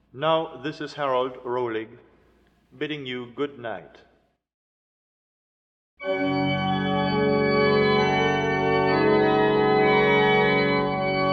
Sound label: Improvisation